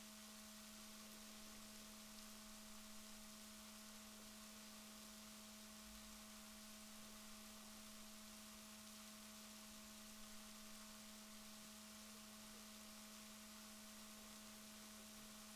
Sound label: rorquals negatif, Balaenoptera Physalus Chile